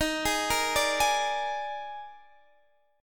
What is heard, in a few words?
Eb Major 7th Suspended 4th Eb